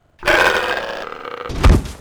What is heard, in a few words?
SNATCHER die; HON; Mob